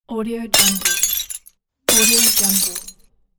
breaking glass

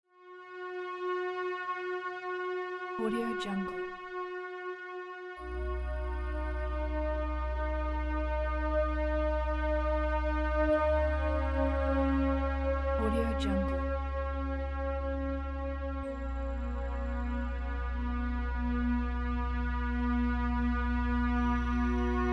soul meditation; moosbeat

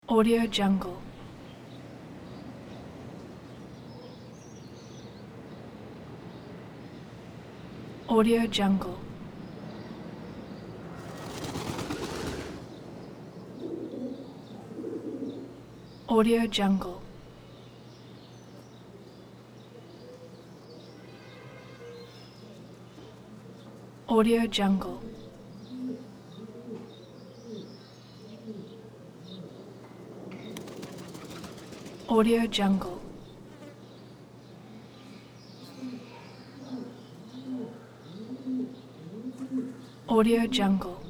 pigeon

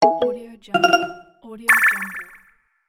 notification

